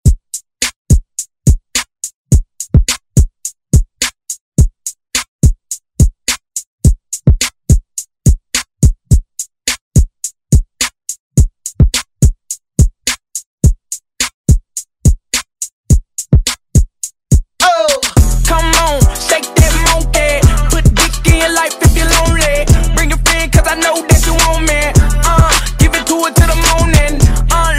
Hiphop Redrum

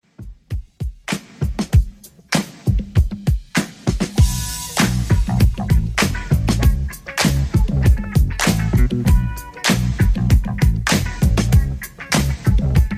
80s Redrum 98bpm

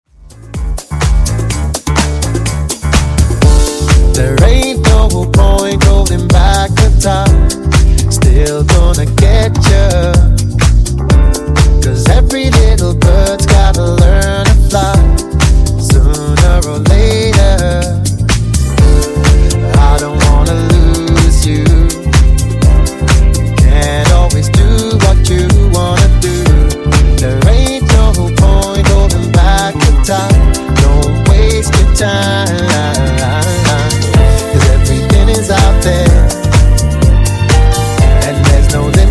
Funk Nu Disko 125Bpm

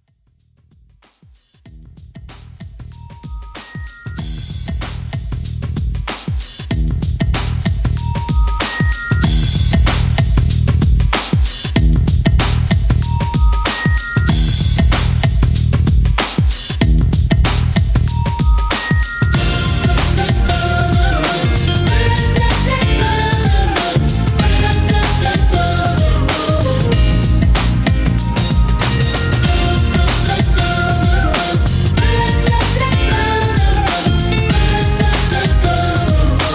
Classic Intro 95Bpm

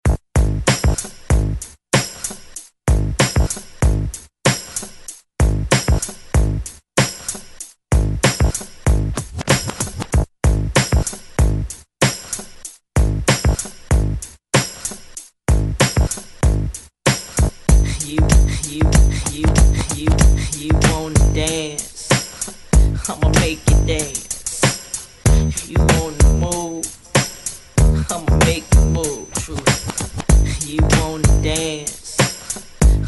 90s Intro Outro 95 Bpm; DJDOLLS

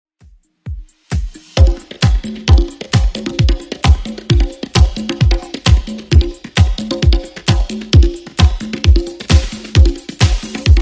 80s redrum 132 bpm

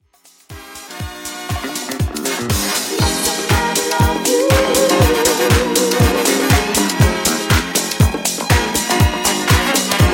80s Redrum 120Bpm

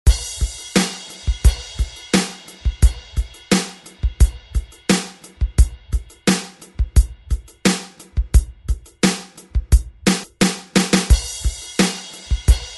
90s

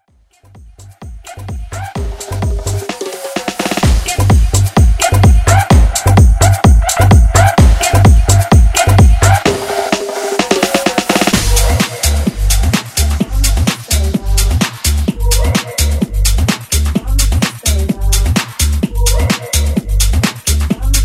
Tribal House; Tribal House Edit

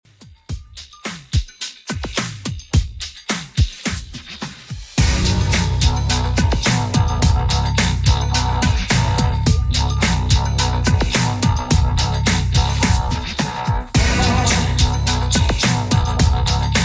90s Redrum 107bpm

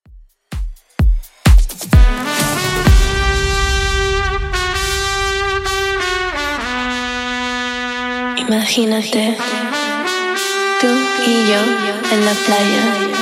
Top40 DJ Friendly 128bpm CLEAN; remixplanet

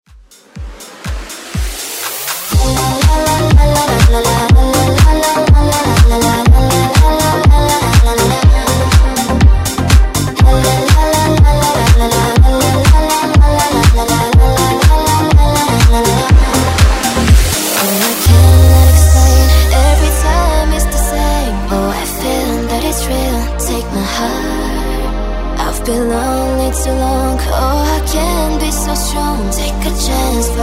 House Redrum

